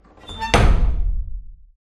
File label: metal door hatch close slam; SFX; Environment; Doors; ProSoundCollection v1 3